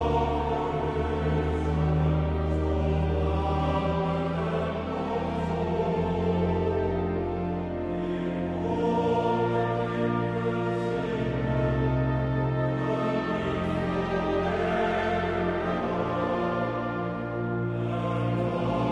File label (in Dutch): koor